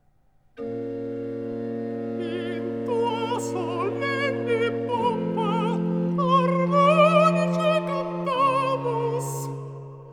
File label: recitativo alto